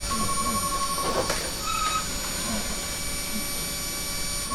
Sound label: service room, room noise; 1000fx